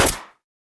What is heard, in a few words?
nailgun metal shoot